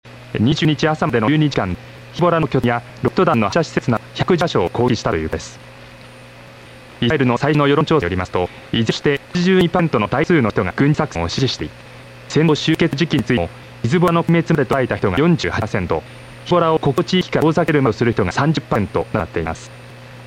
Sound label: TUNER